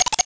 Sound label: pkpkp; ゆめ2っき